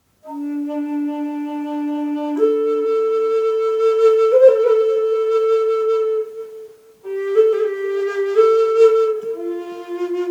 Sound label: love flute D